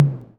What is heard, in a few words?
Tom Low, Korg KR-55B